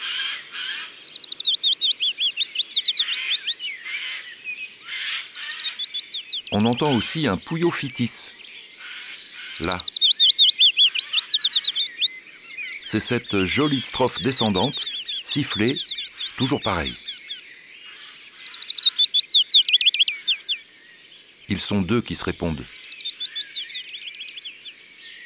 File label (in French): Pouillot fitis; ABC de la nature